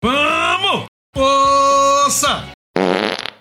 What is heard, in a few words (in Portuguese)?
vamu força peido